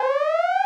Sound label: alarm; Golden Crayon Sounds